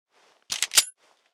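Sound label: weapon pistol 10mm jam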